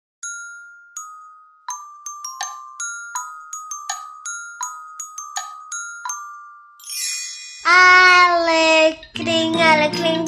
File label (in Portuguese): cantigas de roda; canc folclor brasil